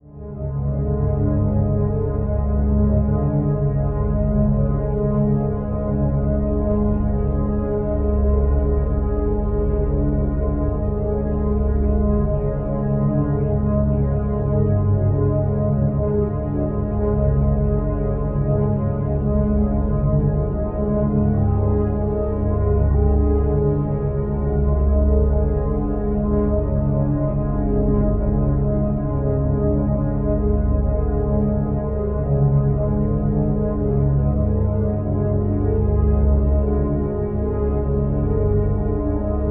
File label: Drone Musical Flange; Production Element Imaging; AdobeCC